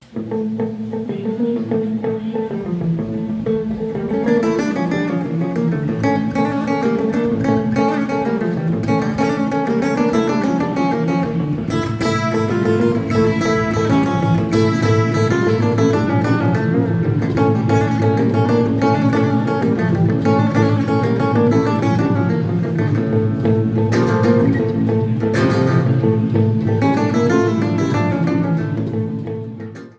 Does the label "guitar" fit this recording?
Yes